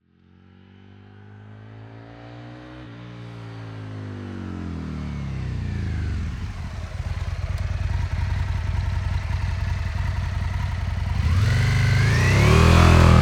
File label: Aprilia SL1000 Falco EXTERIOR Approach Fast Decelerate Stop Idle Drive Away, V-twin 997cc; 2001; Accelerate; Dramatic Cat